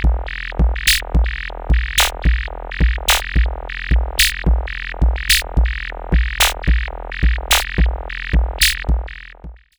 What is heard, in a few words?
Leploop V3 Loop